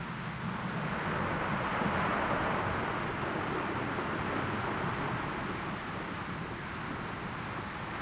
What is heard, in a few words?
Beach Dark, Water; outdoor